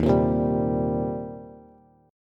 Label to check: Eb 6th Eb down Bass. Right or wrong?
Right